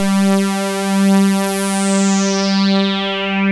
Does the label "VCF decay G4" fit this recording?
Yes